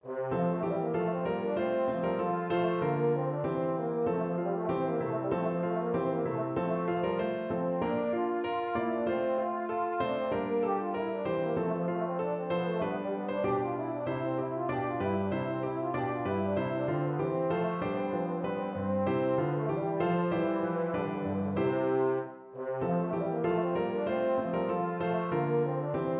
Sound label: EUPH, euphonium